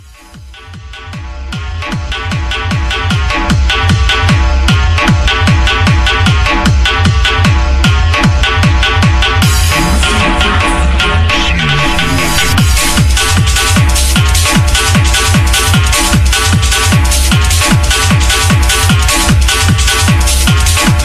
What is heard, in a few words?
Hardbass ringtone